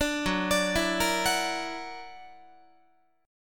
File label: Gb Augmented 7th Gb down Guitar 4A,4E,5A,5D,5G sharp,6C sharp; Listen to Gb+7 arpeggiated